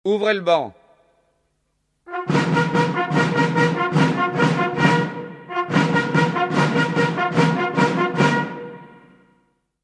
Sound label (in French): clairon; ouvrez le ban